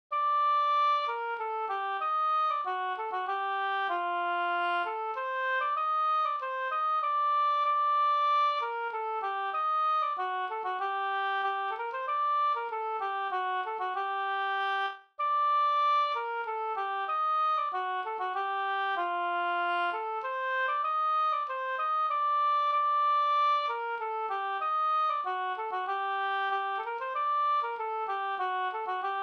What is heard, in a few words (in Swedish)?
polska Kyrkpolska